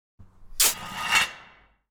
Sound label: BAR PIVOT; yesterday origins gamerip 2016 switch ps4 pc ios macos